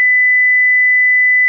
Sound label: square 8k